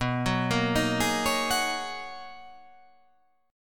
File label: B Major 13th B down